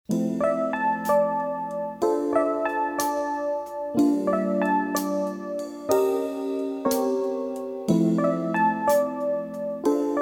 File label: Jazz Ensemble